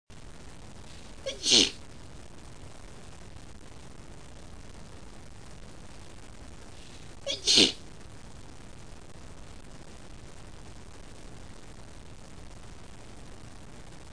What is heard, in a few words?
shy sneeze, female